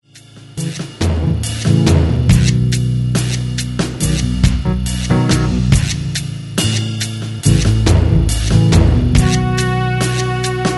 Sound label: groove